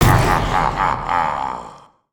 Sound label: smashable; Blue Demon; destructibles